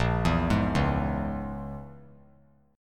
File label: A sharp Minor 7th Flat 5th A sharp, 3C,3F,3A,3D; arpeggiated; 10,11,11,11